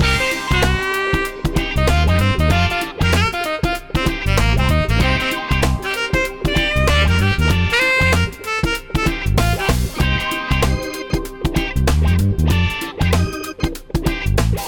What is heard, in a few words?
encounter cool kid; Manamon Music; Manamon 2